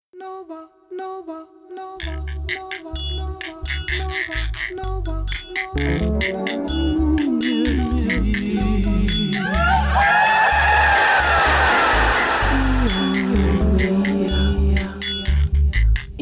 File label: Radio Nova Jingle; Nova 25 ans - 1992